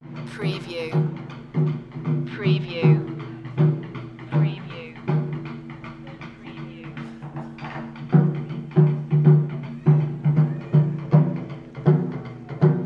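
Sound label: Taiko Drums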